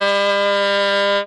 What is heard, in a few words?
sax; Winds